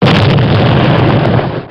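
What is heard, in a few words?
explosion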